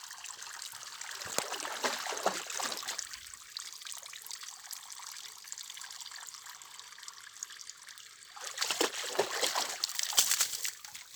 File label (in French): Fontaine des vagues; Bruitages; Eaux